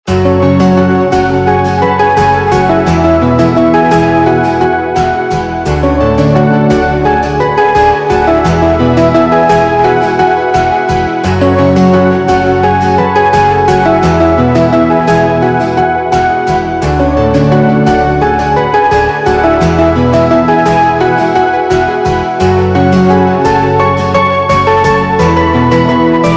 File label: Instrumental Ringtone; Bollywood Hits (2014)